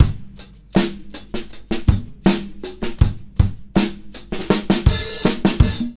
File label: Combo, R&B Drums